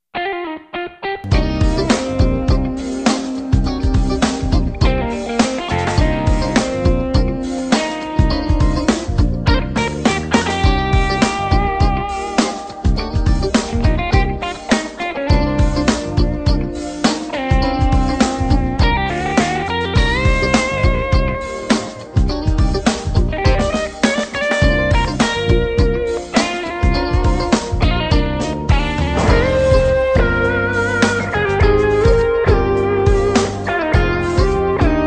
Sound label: funk